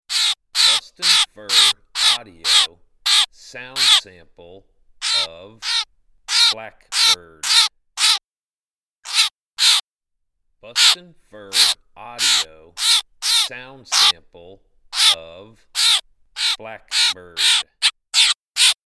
Black Bird

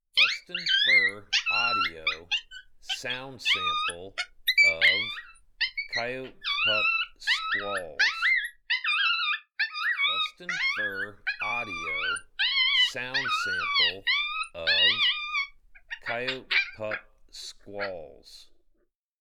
Coyote Pup Squalls

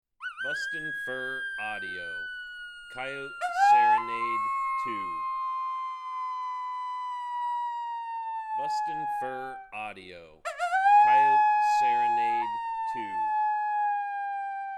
Coyote Serenade

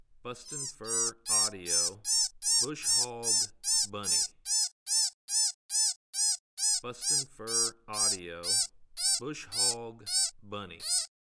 Bunny; BushHog

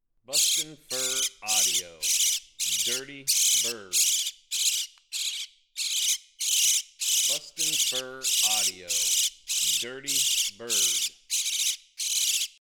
Dirty Bird

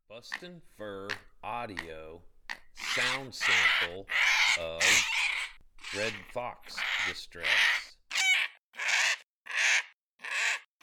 Red Fox Distress

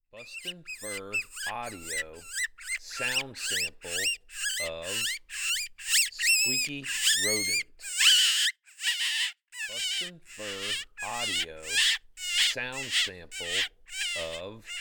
Squeaky Rodent

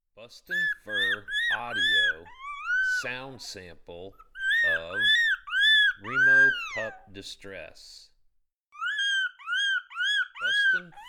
Pup Distress; Remo

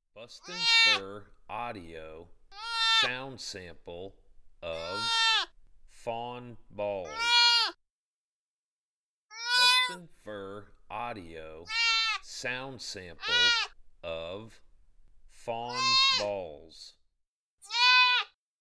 Fawn Bawls

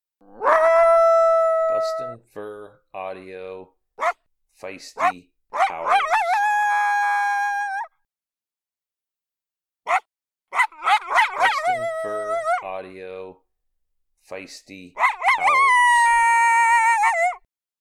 Fiesty Howls

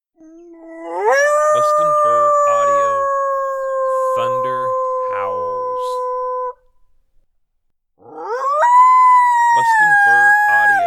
Thunder Howls

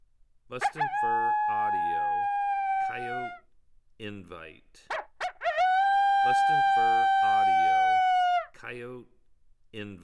Coyote Invite Howl

